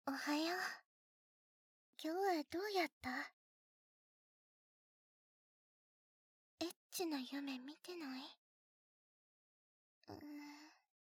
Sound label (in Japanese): ディープキス, 関西方言; エリルとディープキス; 効果音なし; ハーフサキュバス